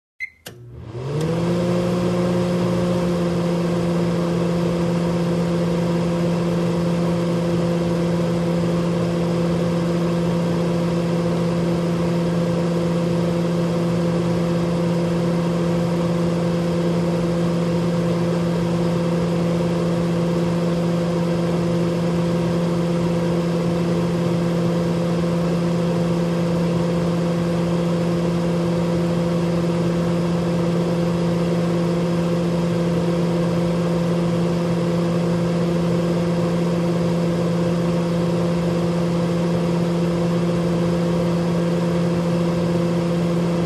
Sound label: Blender turned on